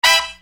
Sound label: Horn Hit, Hip-Hop; Construction Kit; Sonic Foundry (Sony Creative Software)